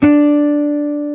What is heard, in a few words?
Jazz Guitar; 90's Sample CDs; Club-50 - Foundations Roland; Jaz-Emperor